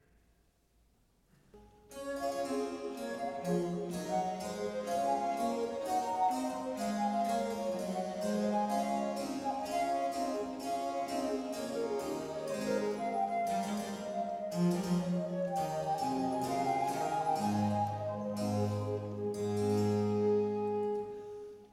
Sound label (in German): Sonate in D-Dur Allegro; Händel